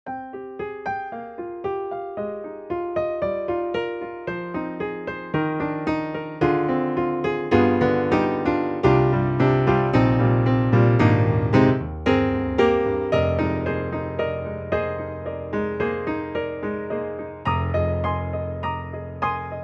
Soft Piano; EDIROL; Super Quartet

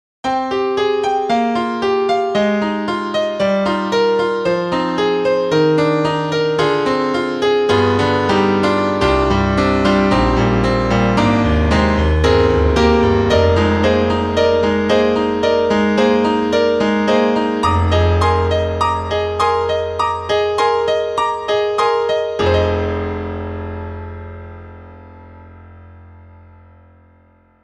Mono Upright

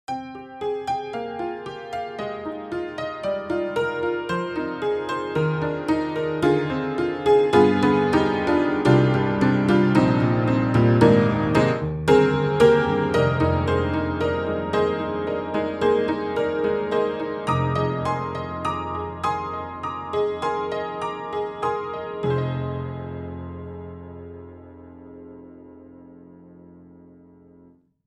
Barroom Upright Piano; Upright Pianos; Synthogy; Ivory II

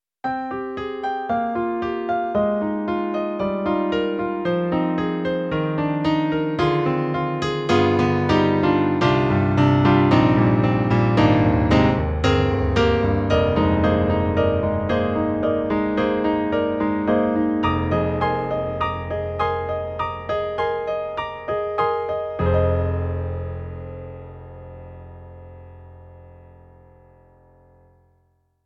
Roland Sonic Cell

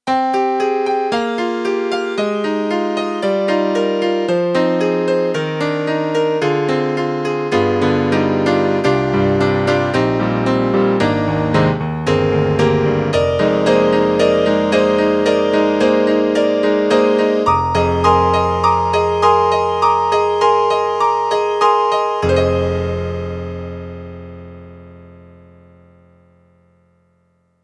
YAMAHA PSS-A50 Grand Piano

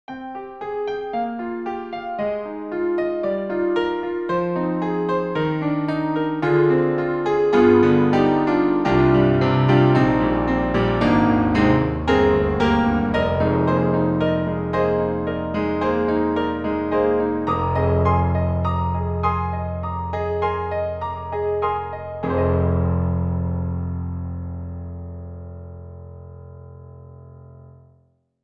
Double Piano

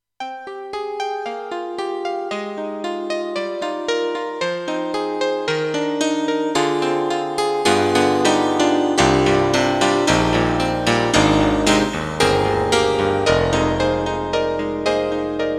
Electric Grand Piano; SUZUKI; BH-1000

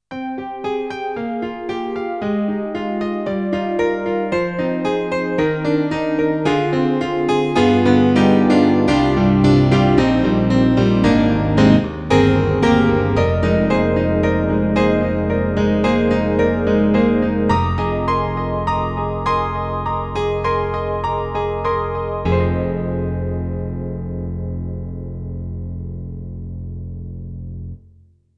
Piano and EP; KORG N1R

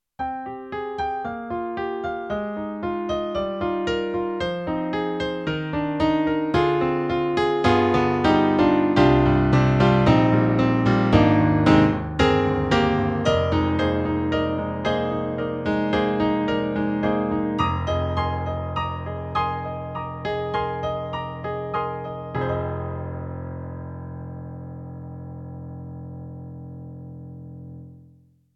EDIROL SD-80, SD Piano

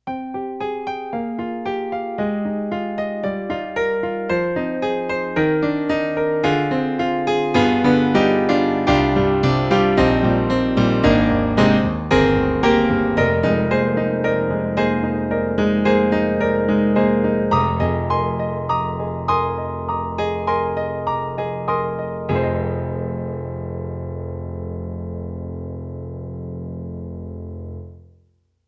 Korg NX5R Piano

